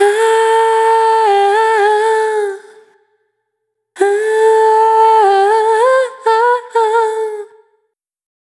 Neon, Single Voice Melodic Loops; Singles

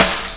Pocket Snare; Urban Shakedown

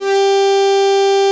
ME ST G4, ANA STR

